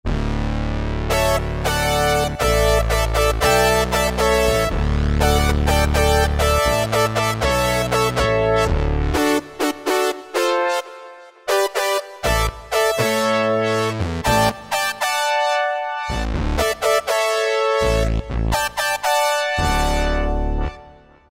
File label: Brass; Cheetah MS6